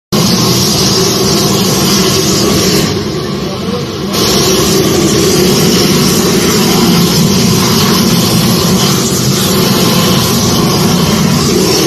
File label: isogaming